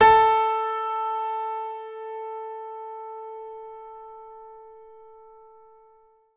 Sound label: one note of steinway gran, a4